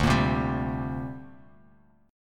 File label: D 7th Suspended 4th Sharp 5th down Bass Standard; D7sus4#5 strummed